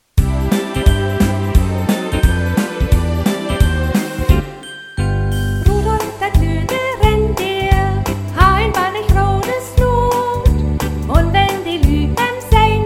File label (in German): Gesang und Instrumente, plattdeutsche Uebersetzung